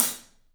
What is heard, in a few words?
Paiste hat, drums; 3D Sound